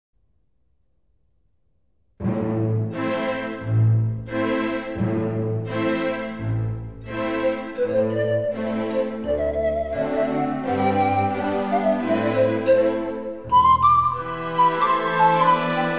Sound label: Roumanian Folk Dances, Arranged for Panflute and Orchestra